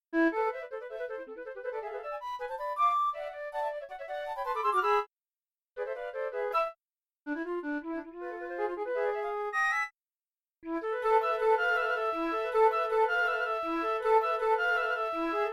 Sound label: 3 Flutes